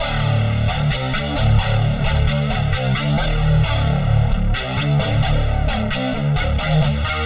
Guitar Synth; Dance; Synths Lead

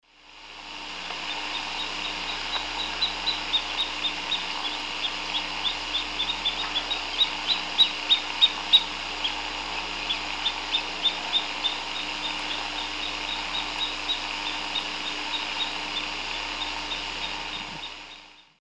Gampsonyx swainsonii, flight calls; S. America; Eastern Lowlands

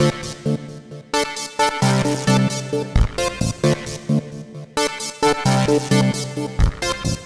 Lead Synth

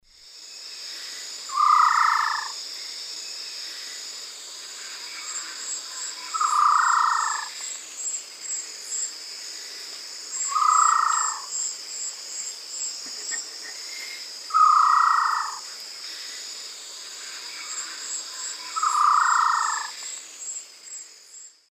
Grallaria dignissima, call; S. America; Eastern Lowlands

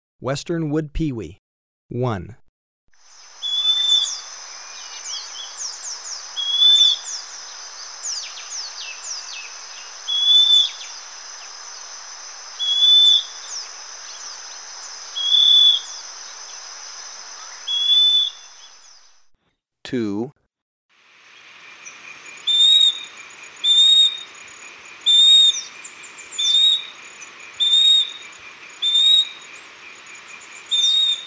Western Wood-Pewee, Birds of Ecuador; S. America

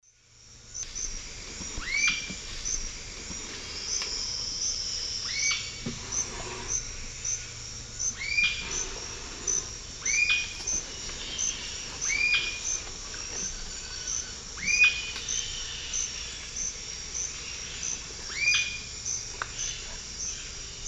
Galbula tombacea, calls and song; S. America; Eastern Lowlands

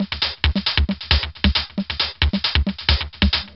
Drums Techno

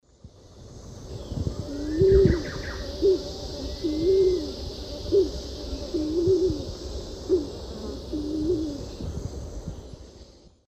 Patagioenas speciosa, song; S. America; Eastern Lowlands

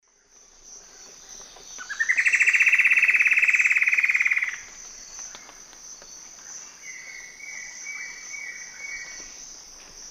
Myrmeciza hyperythra, song; S. America; Eastern Lowlands

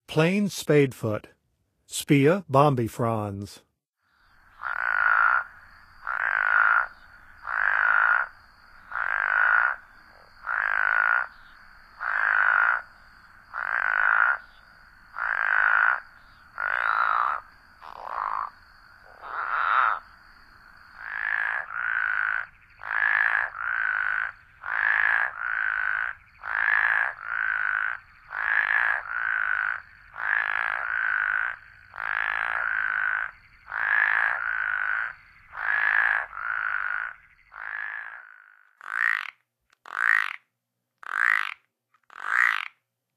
Plains Spadefoot; N. America; Songs of Frogs & Toads of North America

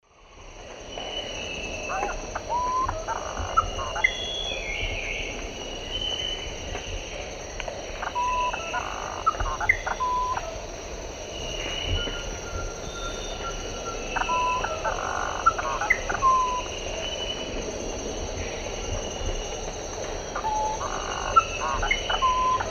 Cyphorhinus arada, subsong; S. America; Eastern Lowlands

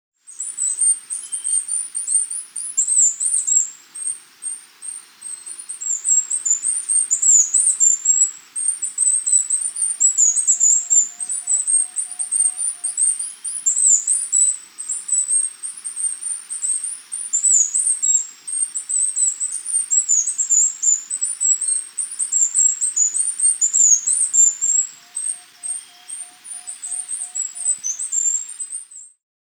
Olive Finch (Lysurus Castaneiceps), Andean Birds; S. America

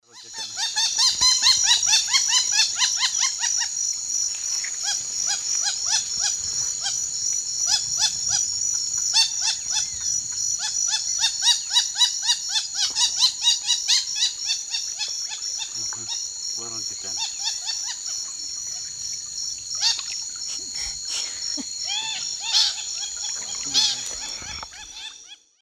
Jacana jacana, alarm calls of pair; S. America; Eastern Lowlands

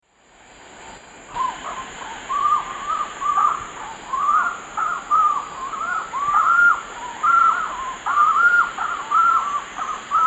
Bucco capensis, duet; S. America; Eastern Lowlands

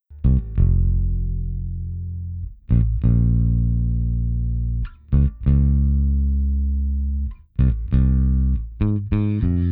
Bass Hip-Hop

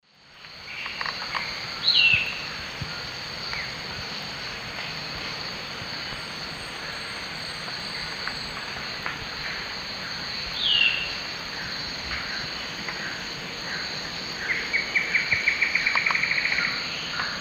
Lathrotriccus euleri, song; S. America; Eastern Lowlands

